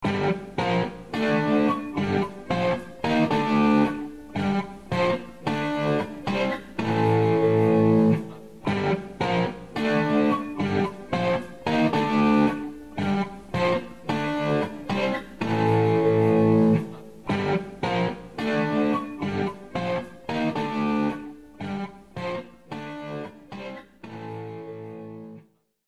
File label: riff smoke